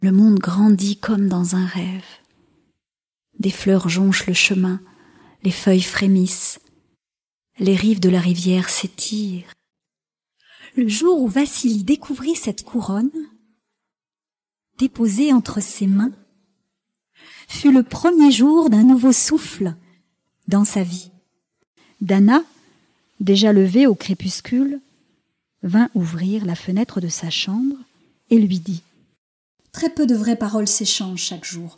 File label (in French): voix vocals